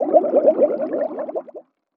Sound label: Underwater Water Splash Gurgle Bubbles Air Valve Movement SCUBA Close Perspective